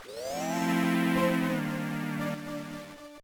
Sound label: Final Victory; Wario Land IV